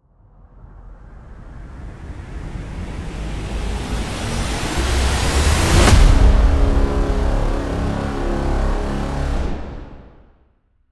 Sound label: Impact, trailer tools, alarming; Narrative Mind